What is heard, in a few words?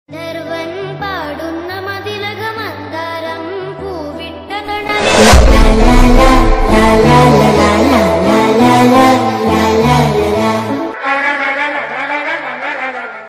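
background music songs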